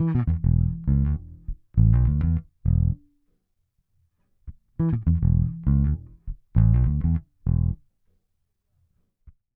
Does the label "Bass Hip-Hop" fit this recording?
Yes